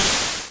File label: Smoke Screen; Pokemon; Gen 1; attack moves; RBY